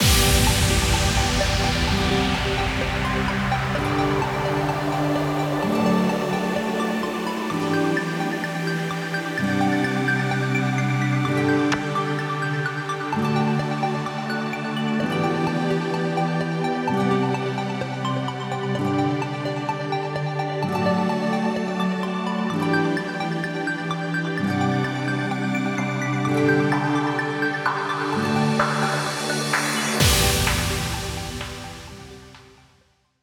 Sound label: BD Pad Pluck; シンセパッドのコードに合わせて、Pluck系のシンセで別のフレーズパターンを入れていく